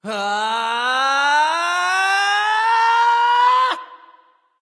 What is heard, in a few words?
battlecry; smite; nezha